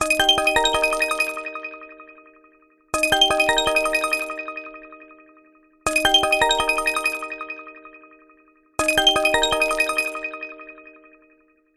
multimedia voip call ring software; zapsplat

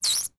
animal rodent squeak single; zapsplat

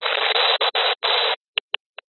science fiction radio signal interference burst

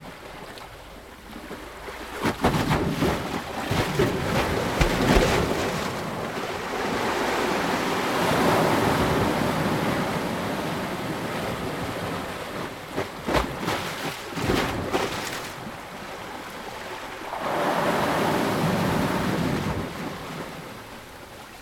water ocean waves steps; sound effects one

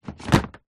industrial cable thick heavy coil down on surface

